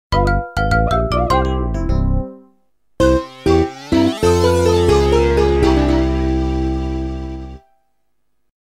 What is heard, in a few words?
Game Over; Super Mario Galaxy